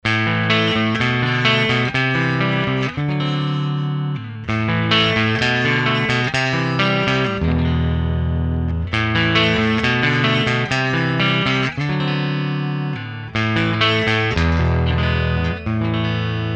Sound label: neck clean; steam hammer; axetec